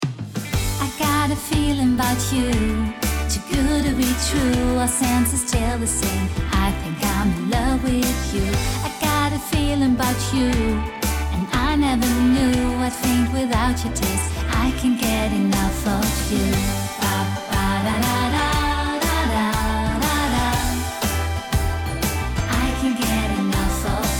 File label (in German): Gesang